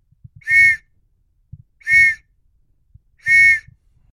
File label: police whistle